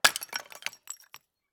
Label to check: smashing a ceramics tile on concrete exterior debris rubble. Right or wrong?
Right